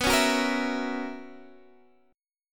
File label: B Major 7th Suspended 2nd Suspended 4th B Guitar Standard; strummed; x,14,11,11,11,12; JGuitar